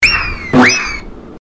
whizz

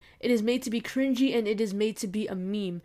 cringy meme

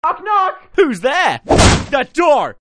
knock knock

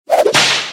whip

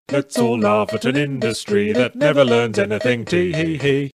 jingle; zero punctuation yahtzees industry never learns anything

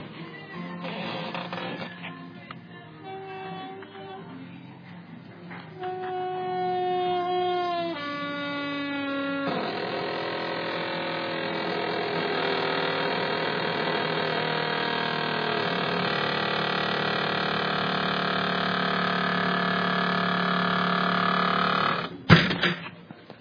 squeaking

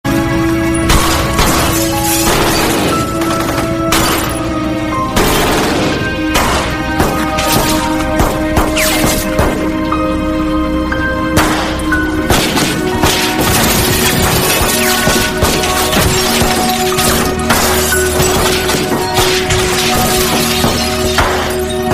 ringtone; zero hour massacre at columbine high

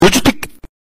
logitech

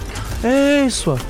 ehh

